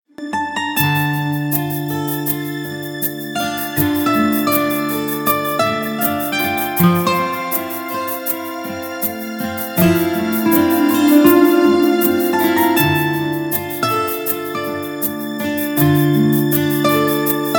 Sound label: love acoustic symphony ringtone